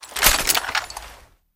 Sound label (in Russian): shum ot svalennih v kuchu avtomatov